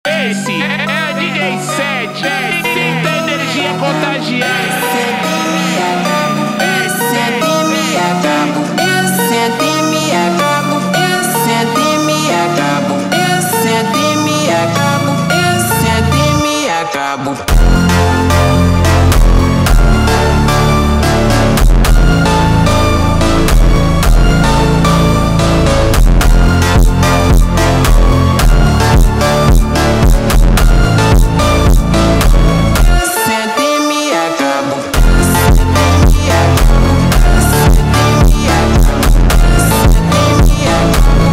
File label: super slowed